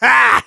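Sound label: laugh short, German; Demoman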